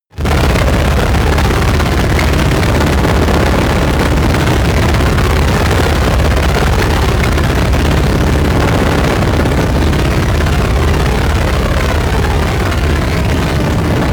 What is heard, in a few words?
space rotor rocket drive hard long; raumschiff